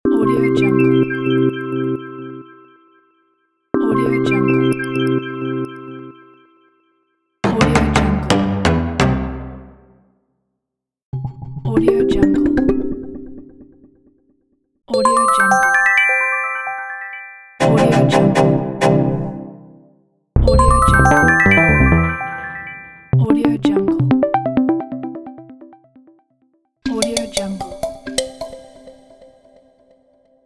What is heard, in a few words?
game win